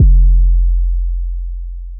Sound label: 808 sub